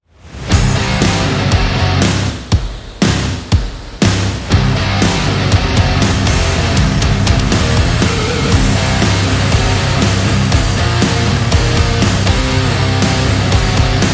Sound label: Cool Heavy Metal; action metal and rock music